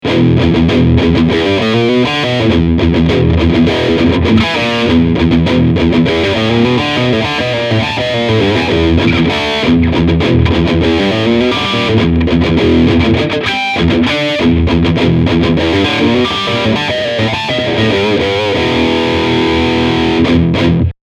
High Gain Riff

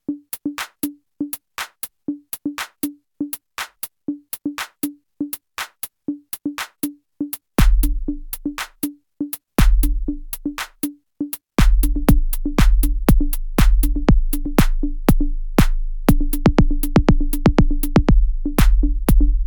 kaossilator 2 Minimal House

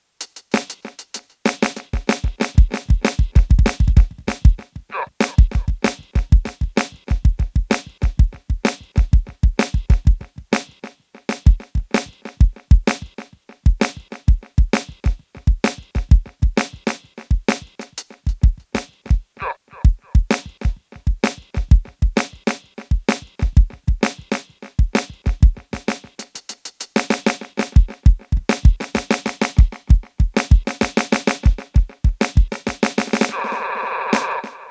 ROLAND SPD SX Pro Jam MFX Kick SN

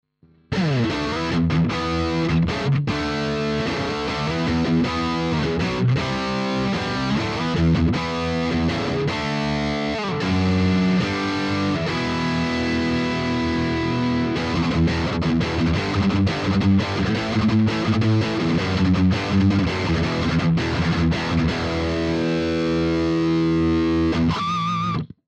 Heavy Metal

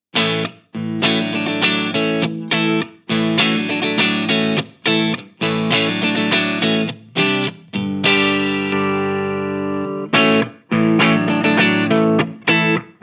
Swede Gold Clean; Hagstrom

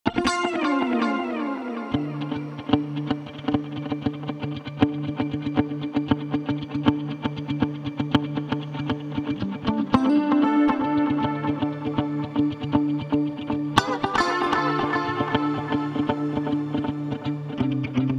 Dotted Delay

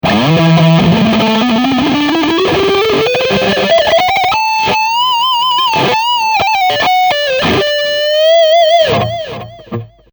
Neck Lead

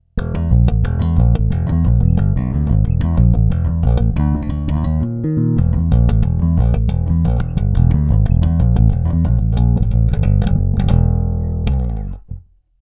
Both Finger

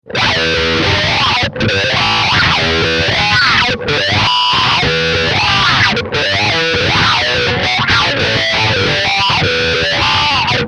Schranz

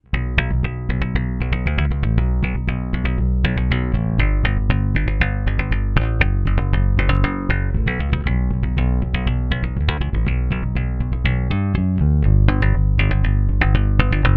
Lo Treble

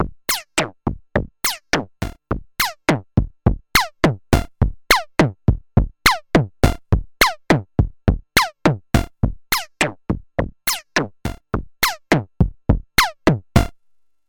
Reon RE501-R Driftbox-R Arcade Percussion

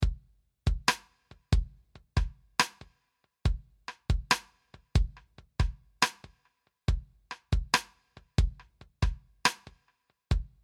Loop Variation

